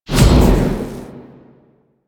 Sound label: Void Dragon Orb Catch; Enemies; Abilities; Warframe; Duviri